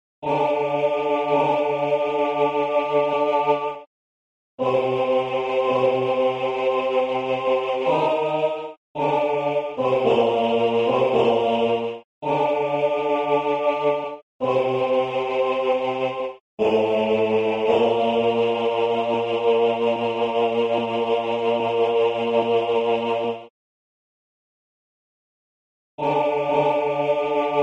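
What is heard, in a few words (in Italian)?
ten bas, tenor bass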